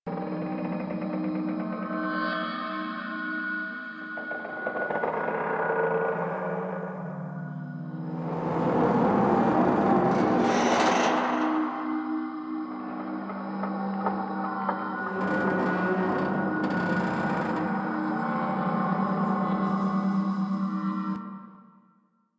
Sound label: Exterior Metal Creak Small Loop; Warframe; Ambience; Duviri